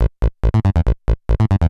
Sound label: TECHNO BASS 140 A